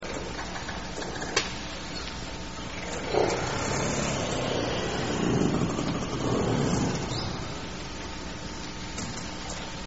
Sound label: snap grumble whistle, transient killer whales; salish sea